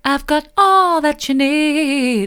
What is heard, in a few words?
soft, vocal